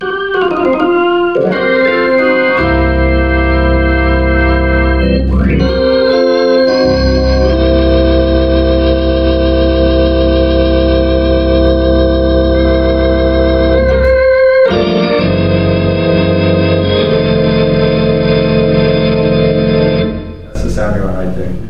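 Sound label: B3 Sound, rotary amplifier speaker; MOON Amp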